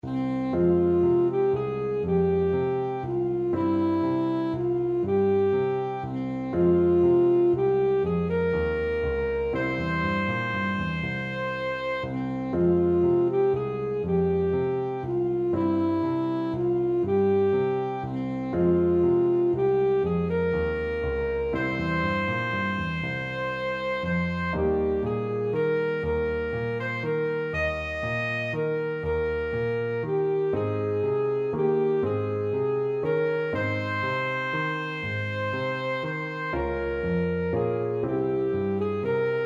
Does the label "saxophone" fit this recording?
Yes